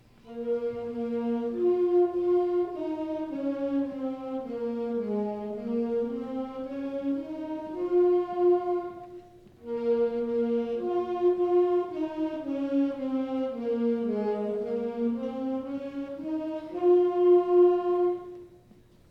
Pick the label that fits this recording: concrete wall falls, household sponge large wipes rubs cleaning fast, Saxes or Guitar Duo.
Saxes